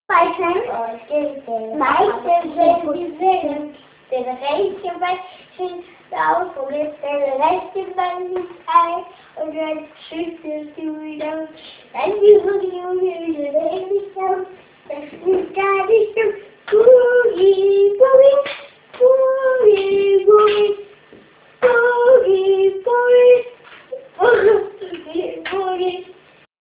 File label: Boogi Woogi